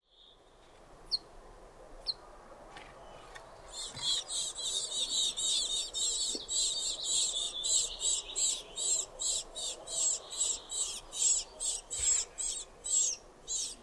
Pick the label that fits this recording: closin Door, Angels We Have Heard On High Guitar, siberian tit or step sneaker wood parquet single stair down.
siberian tit